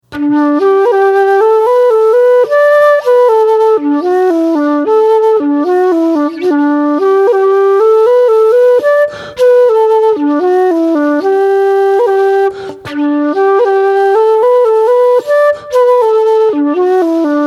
Slide Slower; Brosna Slide

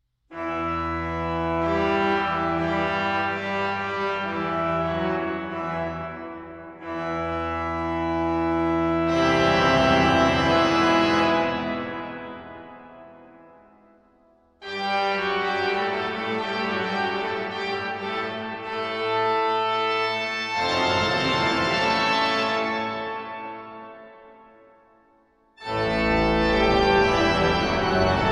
Toccata

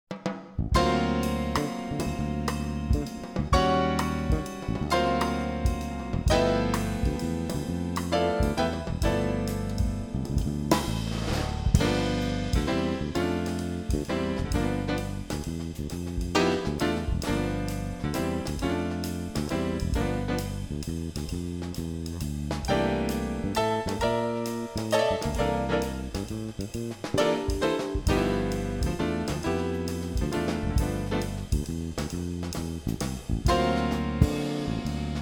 G Blues; Audition Version

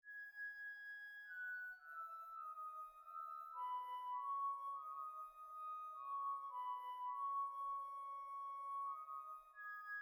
Flute Solo; RK May Night Largo

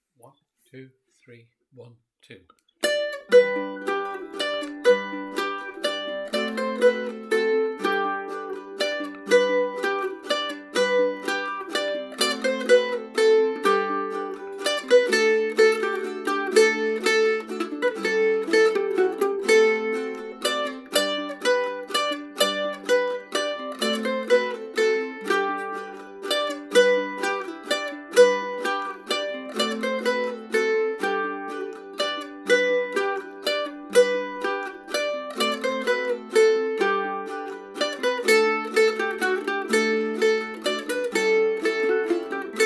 Melody; Come Hasten Ye Shepherds

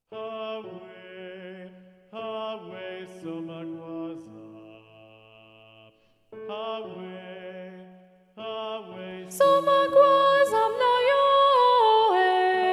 SATB - Alto Predominant; Somagwaza

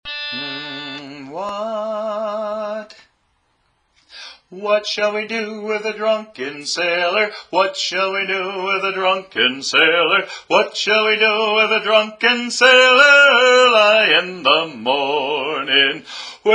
Lead; Drunken Sailor

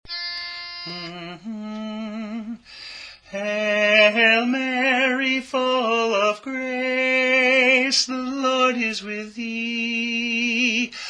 Tenor; Hail Mary

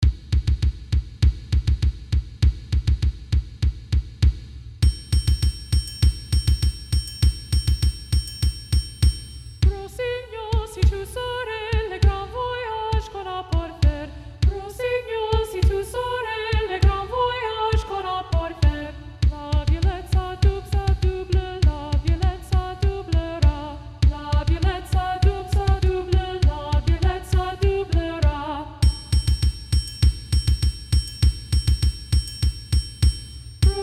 Treble 2 Muted; La Violette

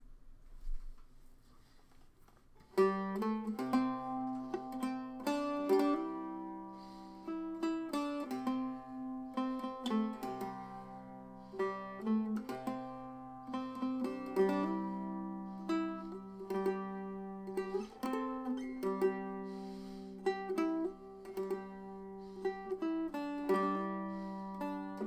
Walnut Mandola

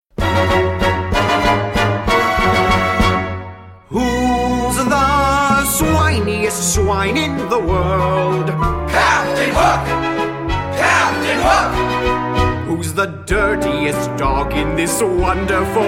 Waltz; Hook s Waltz

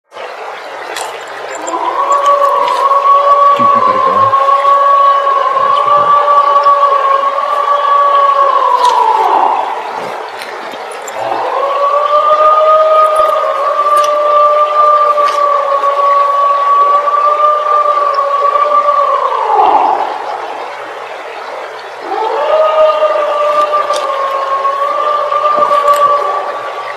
Creek Less Noise; Lightning Creek

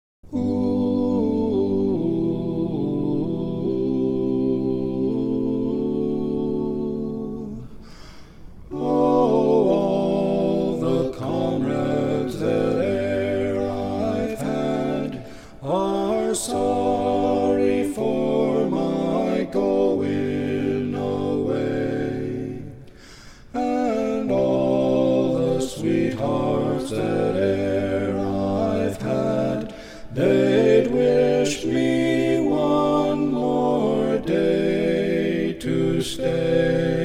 The Parting Glass (All 4 Parts)

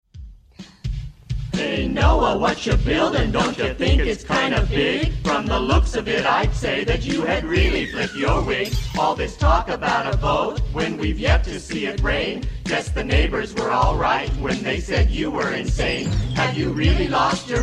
Rap

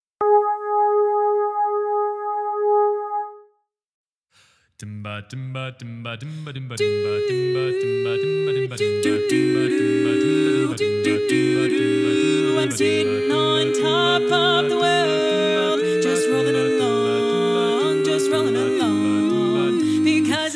satb tenor

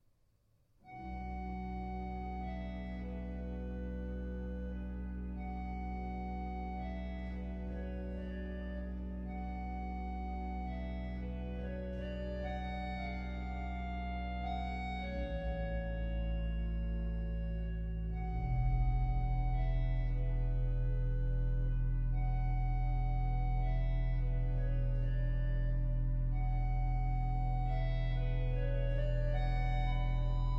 Melodia

